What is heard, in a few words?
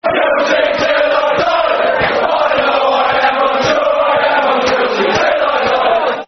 Football Chants, Chelsea; Chelsea Till I Die; Chelsea Cfc